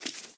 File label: rabbit; smite; medusa